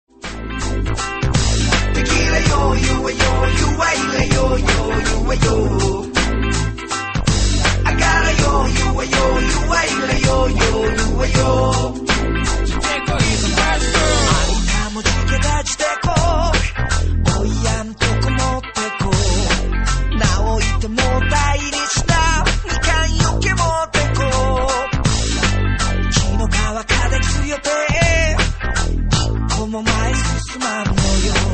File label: funk; wakayama